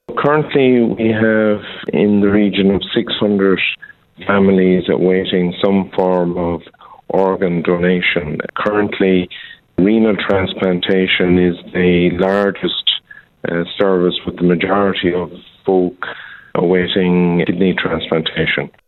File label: organ